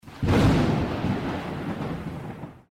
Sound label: thunder sfx